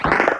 gattling

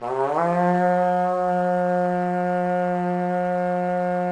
Tibetan Horn E 3; Civilization Revolution

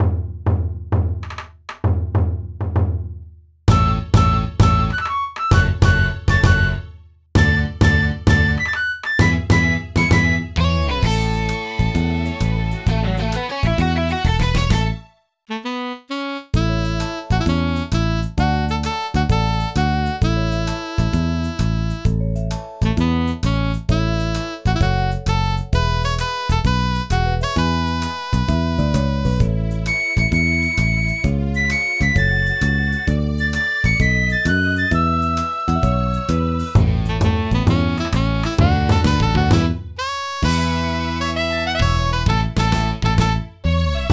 Folk Song J-Ballad Stage; Pinky Street Kira Kira Music Hour Pop Princess; J-Ballad Stage 2

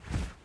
Cloth Walk

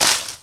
Plant Collision; angry birds rio

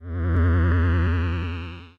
SFX - Revive; browserquest; android

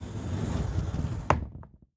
Door Slide Open; The Inn

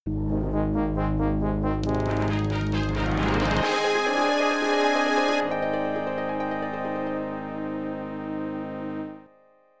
Fanfare, Gravis Ultrasound; Hocus Pocus; IBM-PC; Apogee

